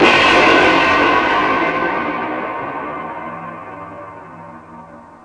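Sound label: cymbals, drums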